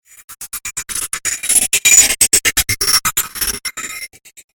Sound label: Mechanic Droid Power Flyby, robot sound effect